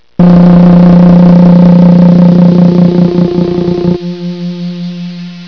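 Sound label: Engine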